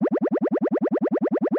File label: Motion Pop